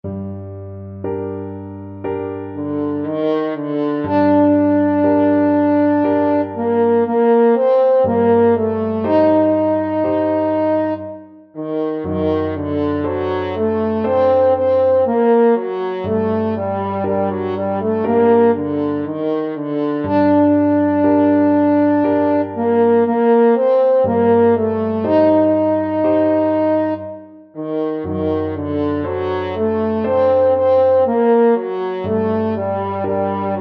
french horn

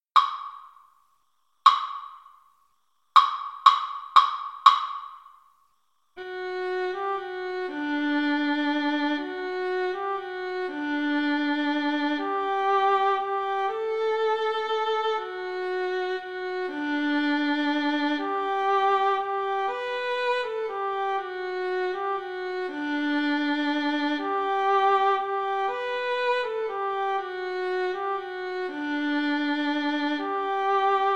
silent night, Violin Flute Duet

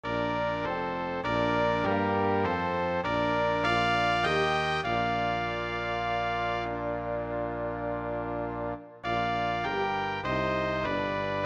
organ

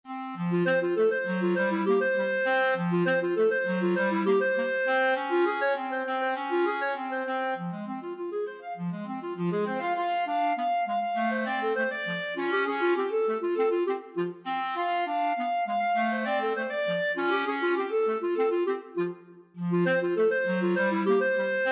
clarinet duet

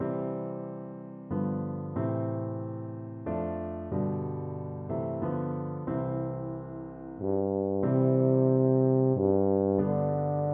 isis und osiris, tuba

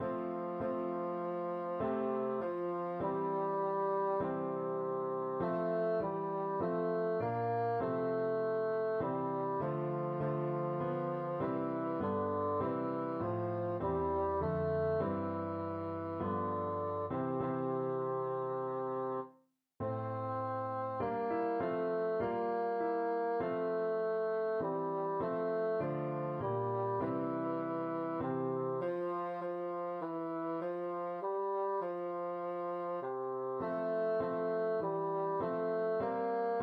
o come, bassoon

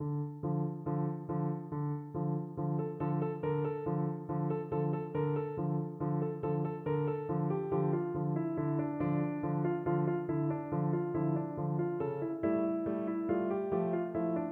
overture PNO

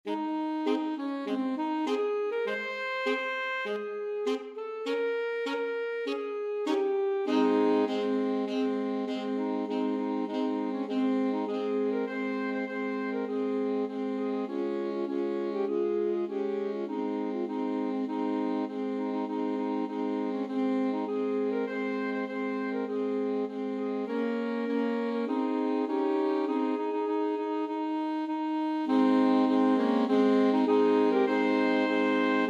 battle hymn, alto sax quartet

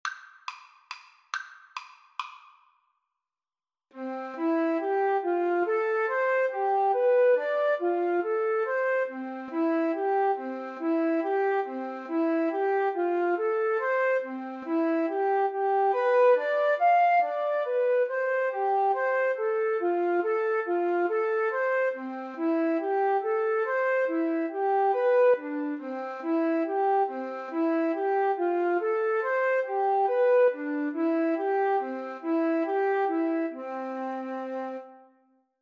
morning has broken, flute duet

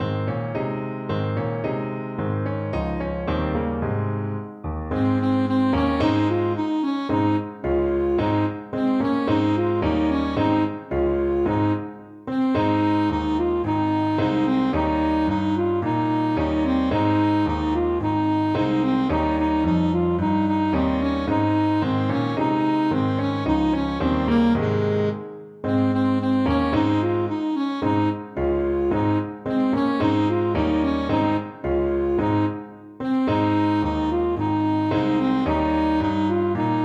ASAX, saxophone

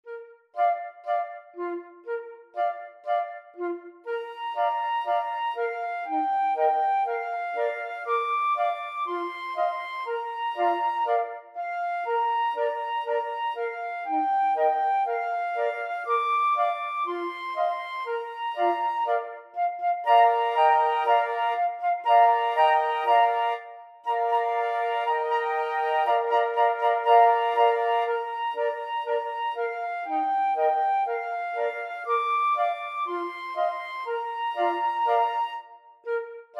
old macdonald, flute quartet

